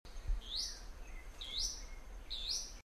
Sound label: Tolmomyias sulphurescens